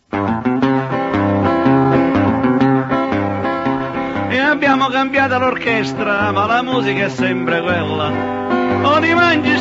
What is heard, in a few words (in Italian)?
l orchestra